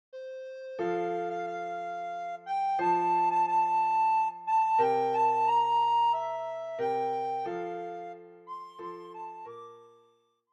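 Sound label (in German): O Tannenbaum Blockflöte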